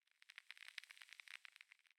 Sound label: Crackle; Pitch Black - A Detective's Demise